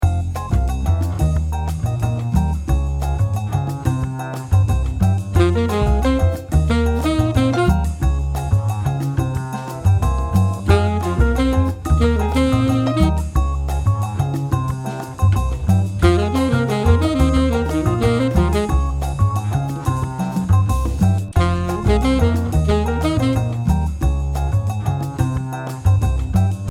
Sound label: Demo sax 90